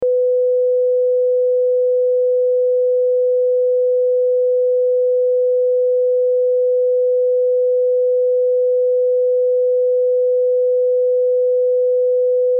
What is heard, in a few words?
Test Tone Sine; Test Tone Sine Wave 500Hz 60 Sec -12Db Loop